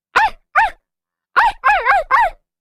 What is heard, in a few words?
barkbark